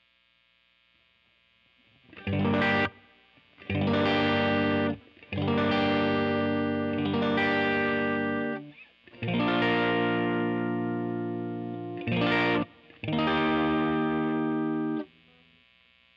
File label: r121 jr sus